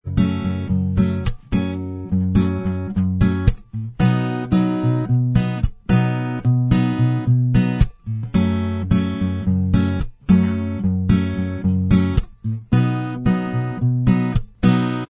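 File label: acoustic, instrumental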